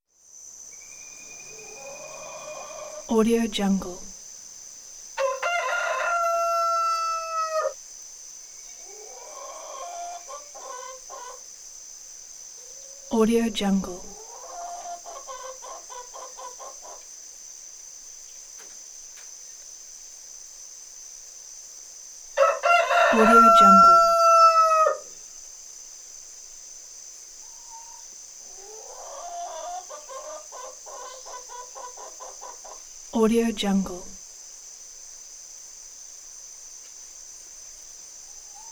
chickens rooster ambience; applehillstudios

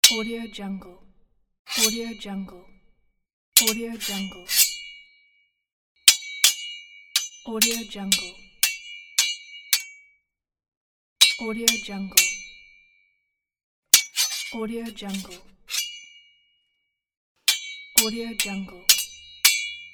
swords

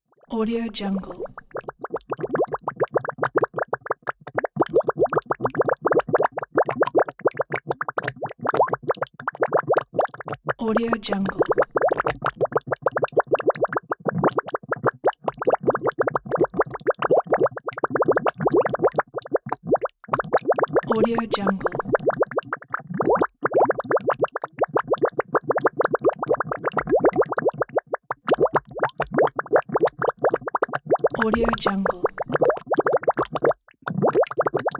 bubbles; capeaudio

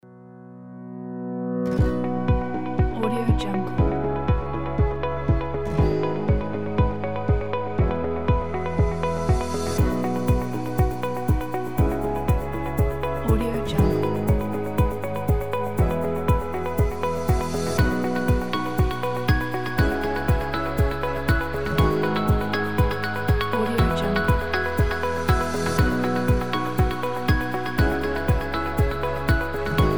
the ambient positive

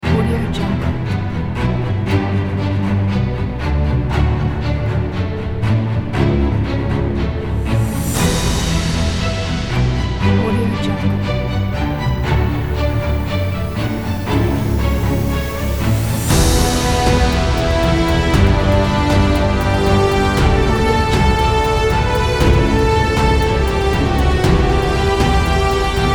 epic bright intro

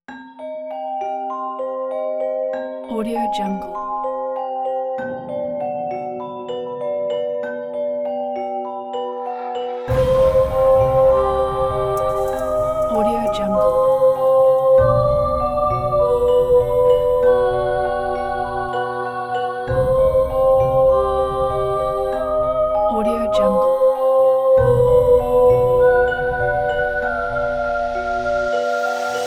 scary night

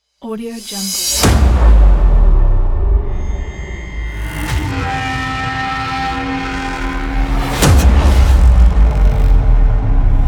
epic cinematic trailer

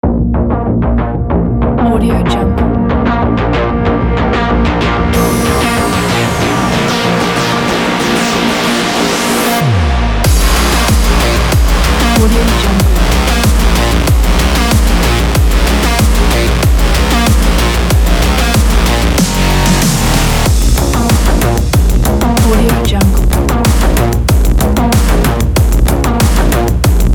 aggressive

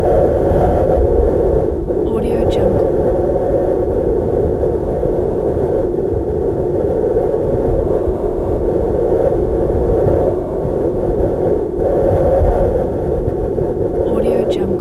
strong wind

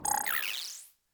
close small interface; soundmorph

